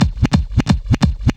scratch, breaks

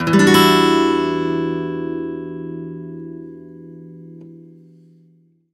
E min downstroke, Acoustic Guitar; Sonic Foundry (Sony Creative Software); Songwriter Acoustic Guitar Companion; One Shot Chords

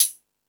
High Hat, Drum Machines E-MU Pro-Cussion

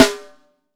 MAPLE SNARE

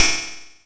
cymb, cymbals

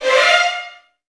VN FX, Virtuoso Strings; ILIO; Kirk Hunter Virtuoso Strings VOL-1

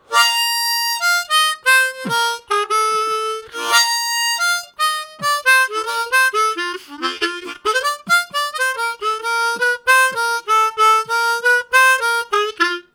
Syncopating, Harmonica; Zero G

